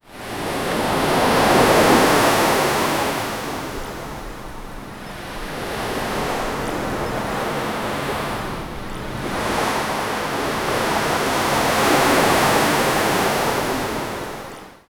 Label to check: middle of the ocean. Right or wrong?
Right